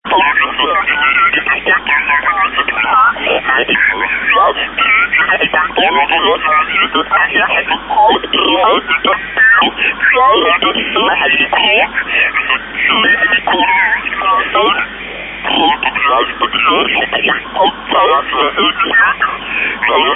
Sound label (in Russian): maskirator